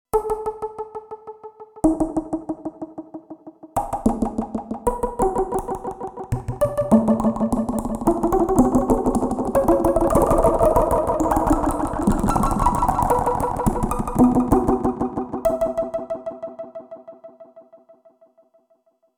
C15 SFX

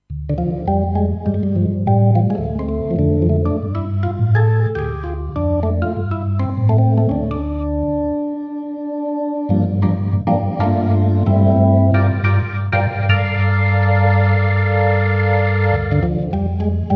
Kronos Organ

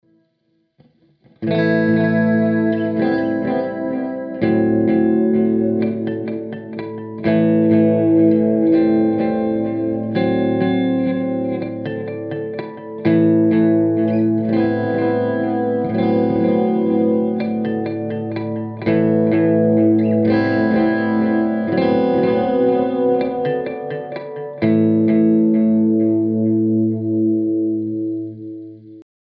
harmonics on

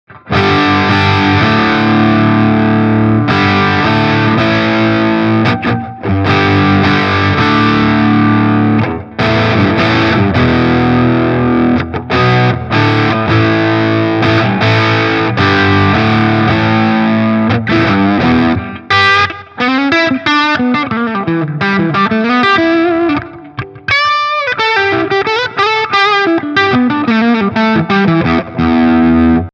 Supro Delta King 8 Combo Gain max. Boost on

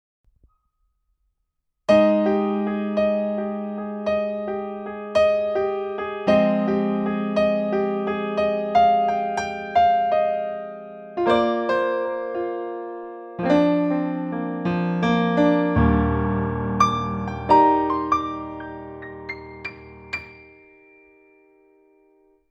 Studio Grand

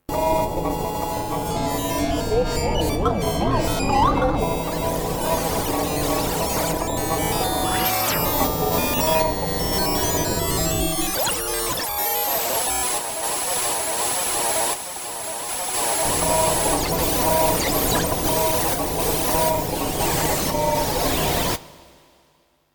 V-Synth Future Atmo

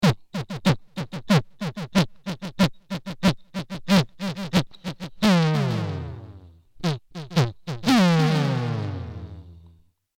synth; soma the pipe

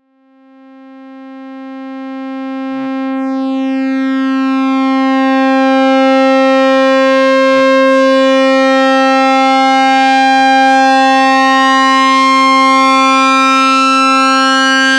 A110 Sync Slow LFO

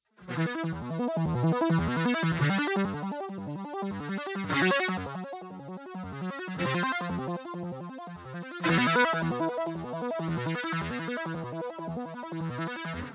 arpeggio

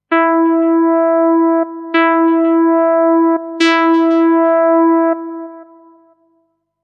FM Syn Lead OP6 Feedback

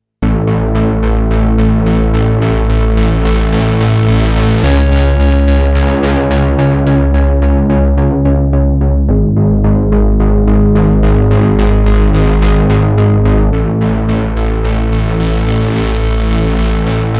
SH-4d Model SH-3d Sync Bass

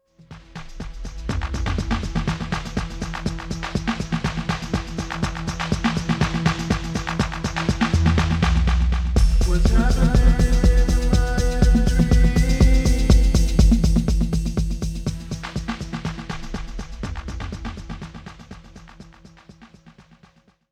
400 MS Nur Wet

